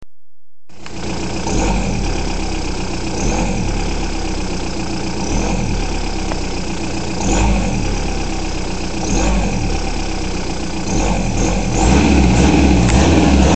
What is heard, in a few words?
whistle, cambelt change now noisy